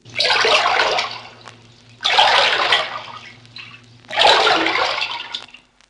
splash

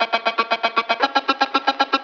elektrofunk, Loops Electronic

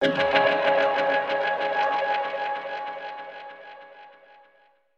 junglist, stabs

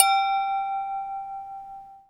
Bells

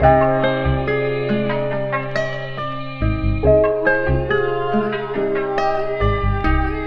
guitar voice aiyee

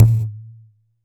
brass, Ticks; Misc Percussion

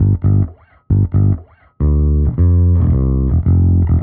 bass, Loops

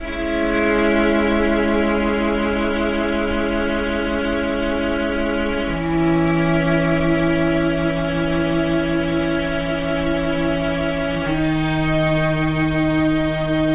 strings sad; Loops

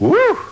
"woo", voice

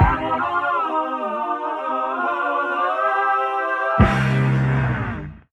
voices flanged

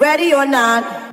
SUNG

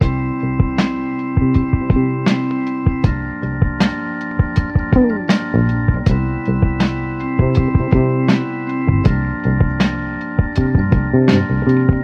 full organ, Loops Phonky

